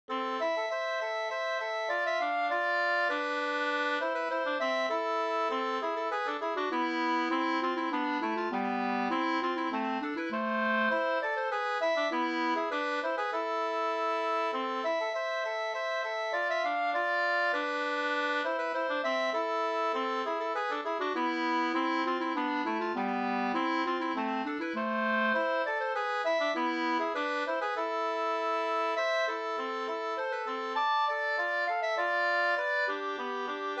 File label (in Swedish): polska Lillpolskan